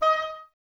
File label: OBS D#, Oboes, Wood Winds; Miroslav Vitous - Woodwind Brass Ensemble